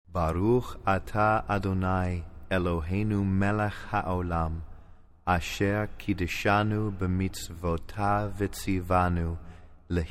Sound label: Blessings Talit